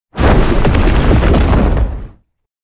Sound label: scorching ray 60ft, spells; psfx; 2nd level spells